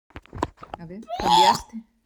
puahh

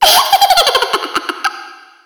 laughing

